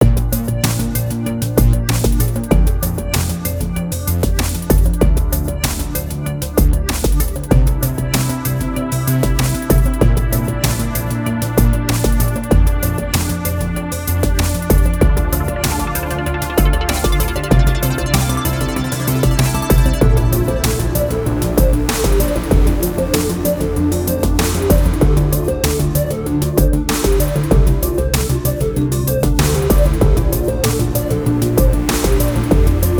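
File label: Music Electronic, Electronic Loading 96bpm; Forza Motorsport 6 Apex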